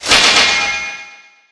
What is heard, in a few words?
Pickup Armour; Pickups